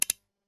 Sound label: sound switch off